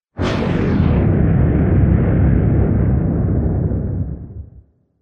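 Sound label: cinema transition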